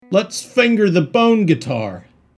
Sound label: bone guitar